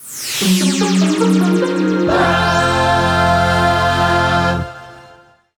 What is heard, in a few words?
Goal Fanfare; crash bandicoot series sound box; Uranus' Mine